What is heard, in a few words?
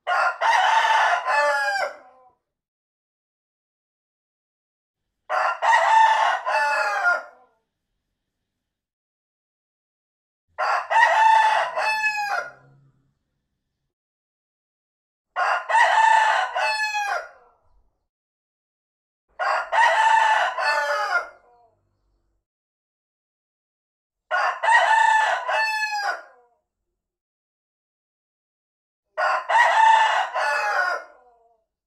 Rooster voice